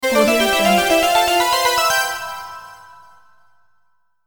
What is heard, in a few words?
fab game level complete